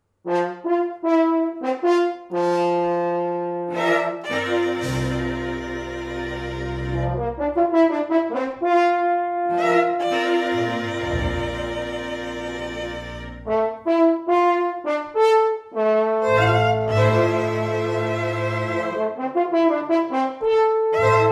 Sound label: Tribute Horn and Strings